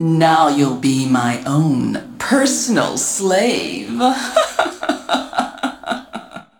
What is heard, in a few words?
Succubus Battle Game Over